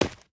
step stone; STALZONE; minecraft

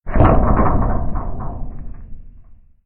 Small ambient metal noise; STALZONE

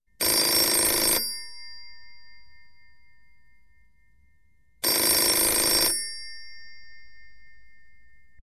telephone call; STALZONE; minecraft

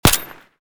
val fire; STALZONE

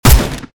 svd fire; STALZONE

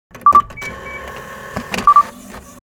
radar; STALZONE; minecraft

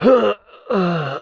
military death; STALZONE; customnpcs; stalker

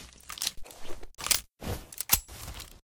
mp7 reload; STALZONE

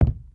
step wood; STALZONE; minecraft

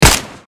scar fire; STALZONE

